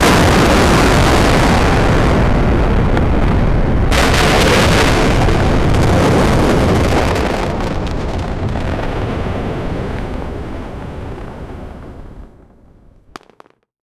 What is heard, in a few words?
sound of many explosions, sequence